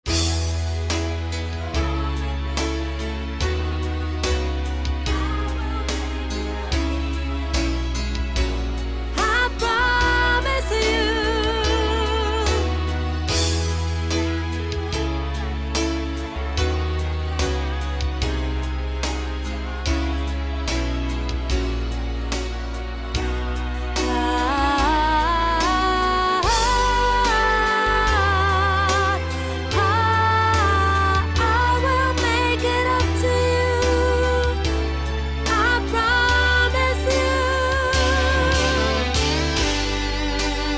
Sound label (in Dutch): stemtest sopraan